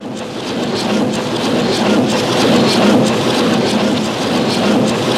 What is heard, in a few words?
Industry Hvy Impact, factory